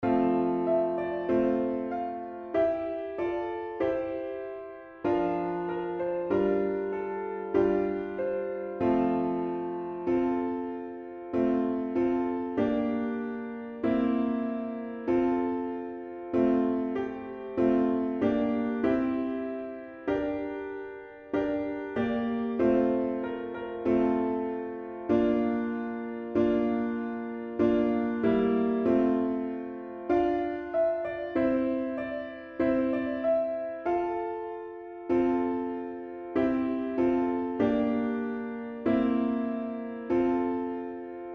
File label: venturing out french horn without solo